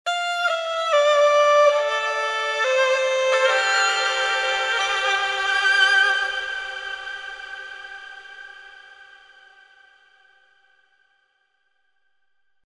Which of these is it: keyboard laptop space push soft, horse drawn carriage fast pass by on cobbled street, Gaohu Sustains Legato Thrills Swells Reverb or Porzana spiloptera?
Gaohu Sustains Legato Thrills Swells Reverb